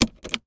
car automatic gear stick move engine off daewoo nexia automatic